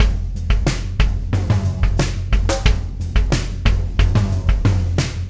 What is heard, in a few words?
Beat, Loops